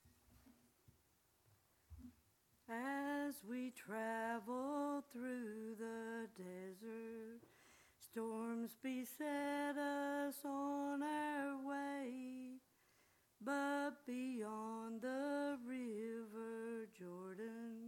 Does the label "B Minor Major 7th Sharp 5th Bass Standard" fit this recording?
No